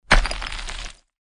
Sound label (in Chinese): maotouying; 音效